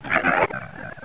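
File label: zombie alert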